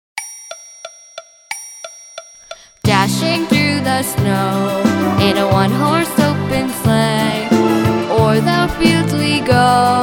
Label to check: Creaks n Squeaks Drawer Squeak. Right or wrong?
Wrong